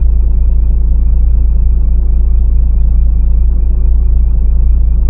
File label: DC13, volvo 9900; Freyfurt